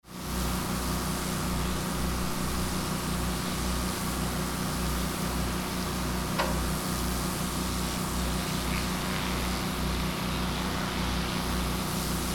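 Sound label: watari decontami, Fukushima Soundscape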